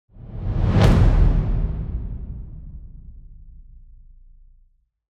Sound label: Swish